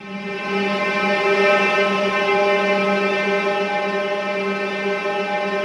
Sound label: SLOW STRINGS